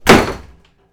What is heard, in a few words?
Aggressive Door Slam